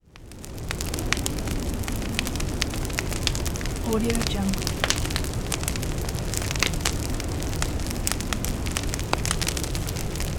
forest fire

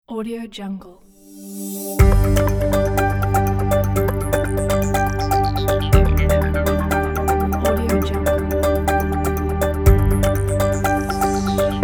breaking news logo

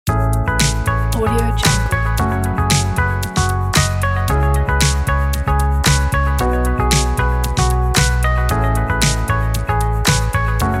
summer pop pack; artsound

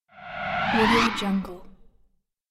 cinematic sound design

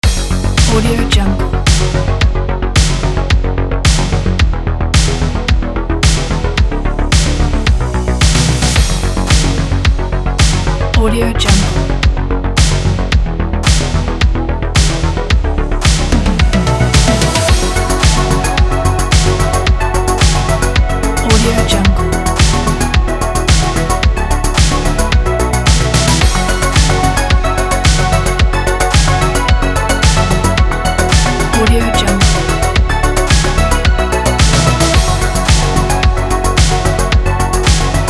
synthwave background

